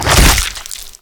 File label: zombie slice